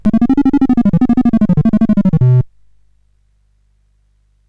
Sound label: CSG8580R5, GAMES; Sammy Lightfoot